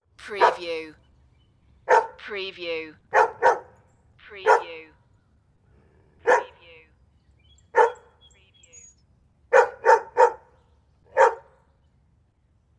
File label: Dog Brk Ext